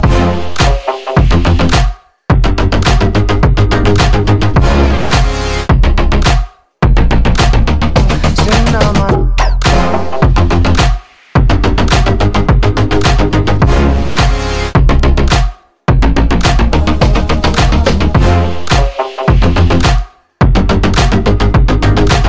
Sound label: Beat